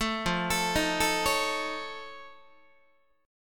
F Augmented 7th F Guitar, chord; F+7 arpeggiated; JGuitar

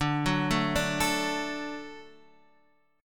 D Major D down Guitar Half Step Down

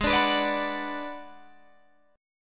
Ab Minor 7th Flat 5th Ab, down, Guitar, Open G

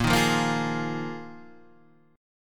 Bb 7th Suspended 4th Bb Guitar Standard; strummed; JGuitar

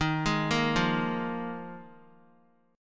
Eb Suspended 2nd Suspended 4th; Ebsus2sus4 arpeggiated; JGuitar

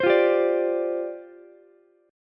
C Suspended 2nd Suspended 4th, down, Ukulele; Csus2sus4 strummed; JGuitar

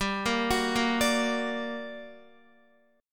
G Minor Major 7th, down, Guitar; GmM7 arpeggiated; JGuitar

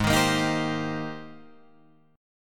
Ab Major 9th Ab, Guitar Drop D

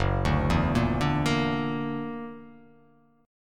Gb Augmented 7th Gb down; Gb+7 arpeggiated

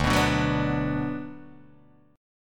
D 6th D down Guitar Open D, chord

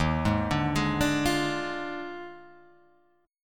Eb Major 7th Suspended 2nd Suspended 4th Eb down; arpeggiated; chord; JGuitar

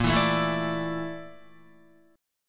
Bb Major 7th Flat 5th Bb down Guitar; BbM7b5 strummed; JGuitar

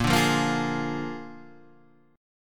A sharp 7th A sharp

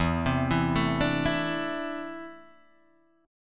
D 7th Suspended 2nd, chord

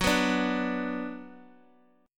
F sharp Suspended 4th Sharp 5th, Guitar, Open D

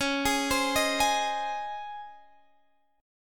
Db Major 7th Suspended 2nd, Guitar, Drop D; x,16,18,17,16,16; arpeggiated; chord shape

